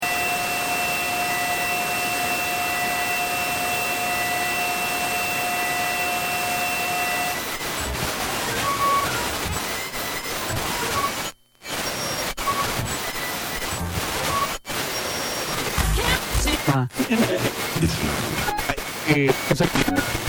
Sony Brick Radio Fm Tuning, FM radio tuning, dialing wheel; coming across various stations; Noise Sound Effects; LynneMusic